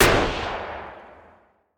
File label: heavy gunshot; factorio; Factorio 0.14.21; fight